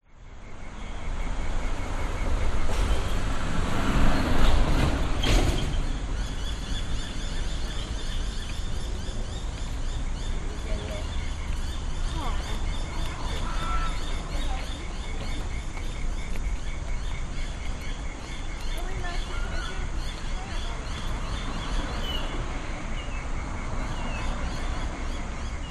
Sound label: public places city street by small park traffic to right pedestrians pass